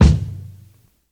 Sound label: thumpy kick drum sound G key; Duration 0:02; Samplerate 44100 Hz